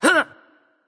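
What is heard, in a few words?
grunt hit, female; smite; voicepack; hera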